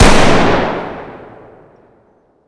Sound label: fire dist int shotgun; gmod; arccw uc commons attachments